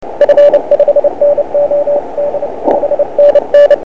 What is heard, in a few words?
cw